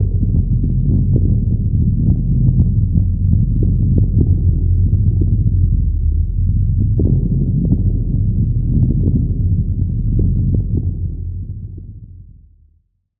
drone, Alien & Drones (atmo); ambient; Chillout

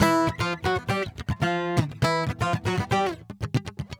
GUITAR SALSA

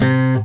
ACSPOP, Guitar & Bass; Quantum Leap

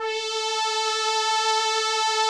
SOLINA, String Machines

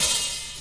KIT, Dance Mega Drums, HIP; Best Service

